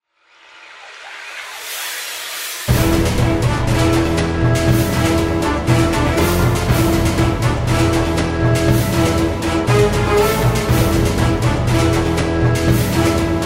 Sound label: Tension Mix